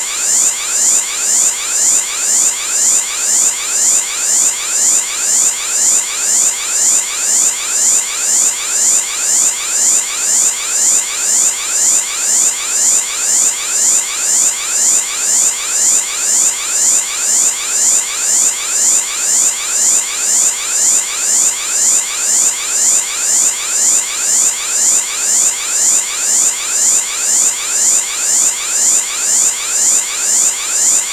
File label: Base Line; Super Saiyan